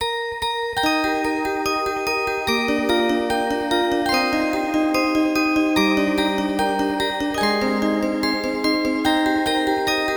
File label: Orgel, Music Box